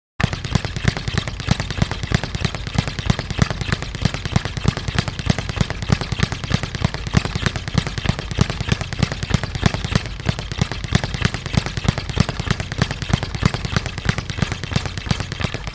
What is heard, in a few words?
Boxing Hit Speed Bag, boxing